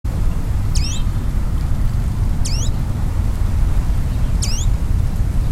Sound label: warbler pallas leaf Phylloscopus proregulus